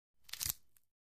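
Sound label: Athletic Tape Stretch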